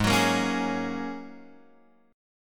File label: G sharp 9th Suspended 4th G sharp, down, Guitar, Open F; strummed; chord